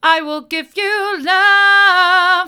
hard, vocal

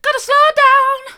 gotta slow down mid, vocal

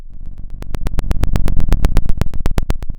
pop, Sound of a fire crackling

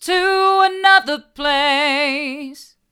to another place hard, vocal

